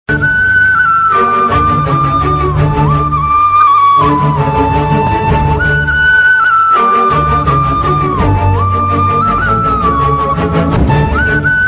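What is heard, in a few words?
brother and sister love background music; anna thangachi bgm; kadal creations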